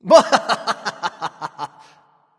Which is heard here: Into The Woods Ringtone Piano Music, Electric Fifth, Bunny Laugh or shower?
Bunny Laugh